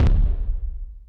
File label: kicks, Bass Drum